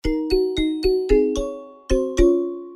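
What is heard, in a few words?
simple xylophone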